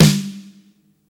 old school dope steel snare drum sound b key, steel snare drum tuned to the B note